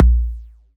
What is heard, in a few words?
Kick